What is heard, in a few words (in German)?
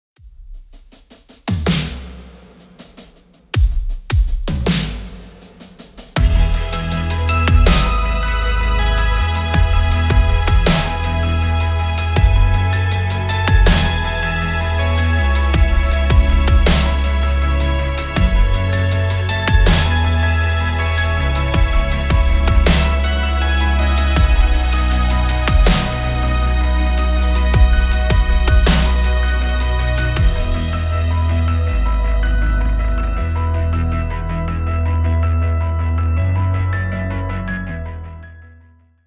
Mittelpunkt PLAYBACK